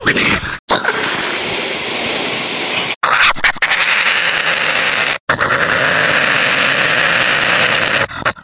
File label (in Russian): barsuk zlitsja